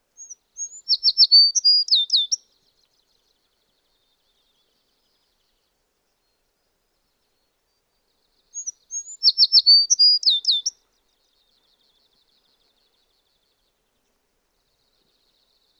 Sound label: American Tree Sparrow